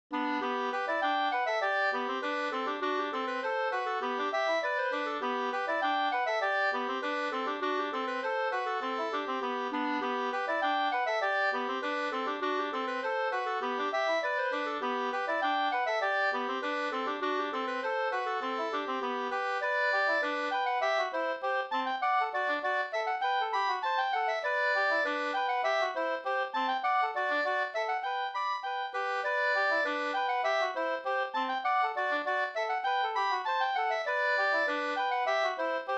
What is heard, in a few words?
polka; fr An Nr1 Kempe02 2st